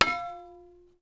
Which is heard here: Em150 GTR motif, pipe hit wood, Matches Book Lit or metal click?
pipe hit wood